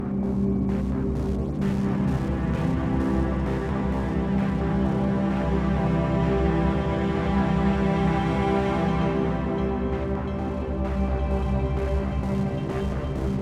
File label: Ambient